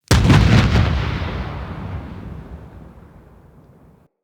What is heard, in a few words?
Destroyer Meltdown Small Explosion; Vehicles; RailJack; Crew Ships; Grineer Crew Ship